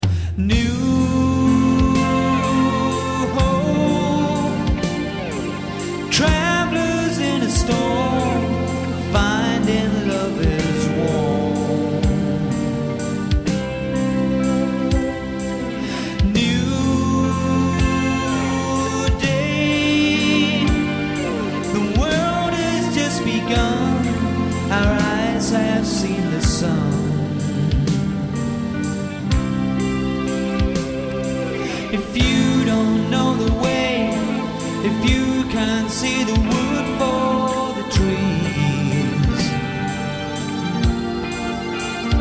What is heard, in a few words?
blue guitar